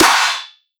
Clap Metallic; pitchglitch

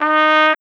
Trumpets; pitchglitch samples; Nacho3m3

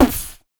Beat Box Snare

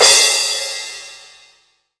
Crash Short; pitchglitch

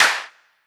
snare drum; foxdot default

